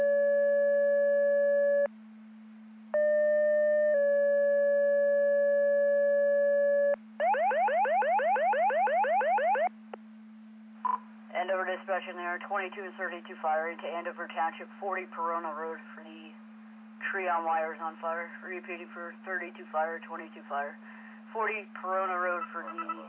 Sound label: Siren; Andover Twp Boro